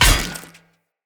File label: Sphere Polearm Medium Impact Flesh Armour Hollow